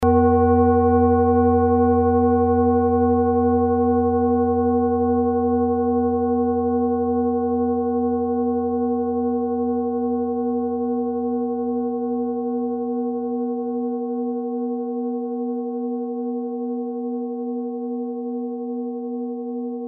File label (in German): klangschale nepal, Klangschalen Nepal